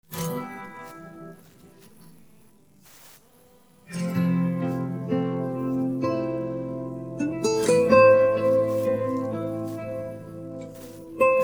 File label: instrumental; persian